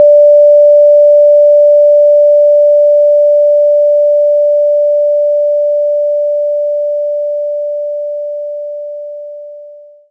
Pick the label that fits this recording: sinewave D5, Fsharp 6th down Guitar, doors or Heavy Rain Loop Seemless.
sinewave D5